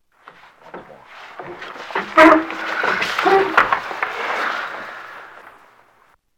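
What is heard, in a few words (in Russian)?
звук столкновения стола и стула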